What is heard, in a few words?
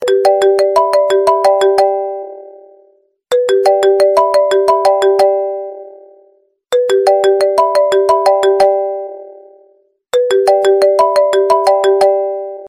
sms tone ringtone; iphone